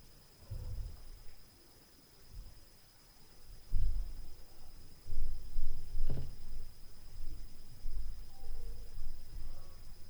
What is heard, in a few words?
Upstair Noise; Night Noise